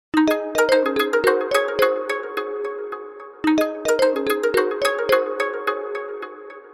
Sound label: ringer incoming string; Alcatel OneTouch GoFlip 4044T